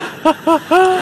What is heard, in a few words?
laugh